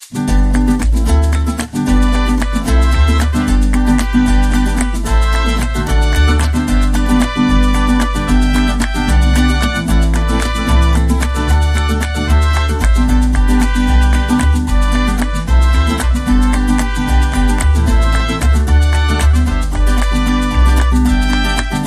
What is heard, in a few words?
Mariachi Fun